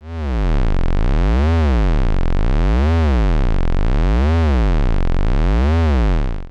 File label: Frequency Modulation Lo